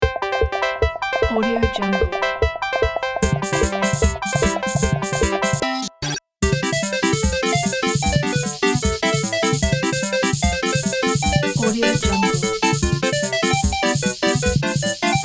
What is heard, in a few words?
8bit game chase level